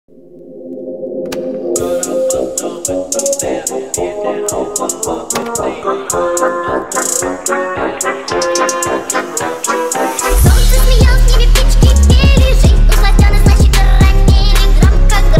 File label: phonk trap remix